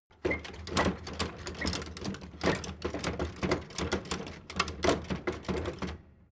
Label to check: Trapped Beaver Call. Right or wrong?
Wrong